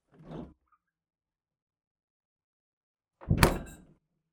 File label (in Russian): dverca holodilnika